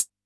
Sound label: HHCL, Yamaha DD-65, HH